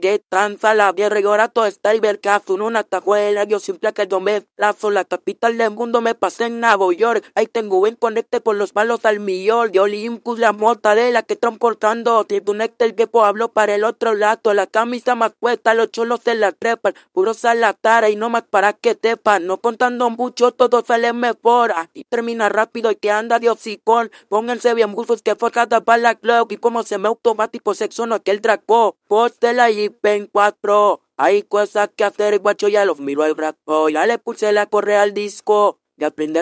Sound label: Vocals Acapella